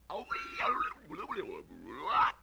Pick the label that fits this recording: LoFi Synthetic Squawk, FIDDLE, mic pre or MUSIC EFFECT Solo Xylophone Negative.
LoFi Synthetic Squawk